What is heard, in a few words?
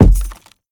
Footsteps Wood Run; Devour; The Town